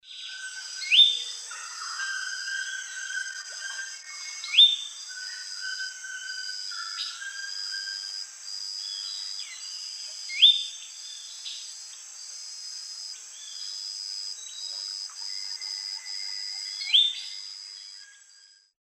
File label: Euphonia xanthogaster, call; S. America; Eastern Lowlands